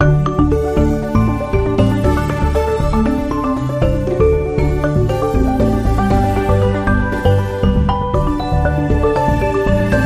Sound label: No Perc